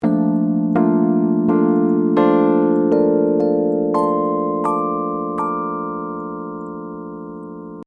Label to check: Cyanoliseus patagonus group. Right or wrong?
Wrong